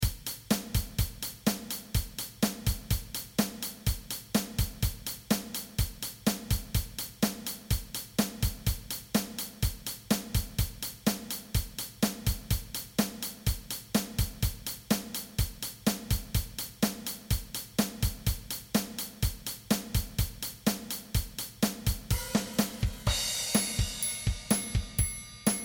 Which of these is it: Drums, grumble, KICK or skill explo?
Drums